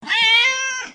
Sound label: Pet Cat Speak; creatures pets